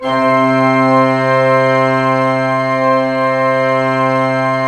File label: PLENO C3, Cathedral Organ, PEDAL PLENO; Propeller Island